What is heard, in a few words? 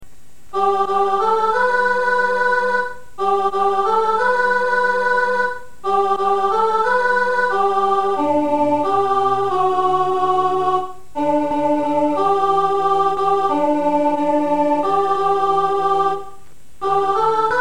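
saints alto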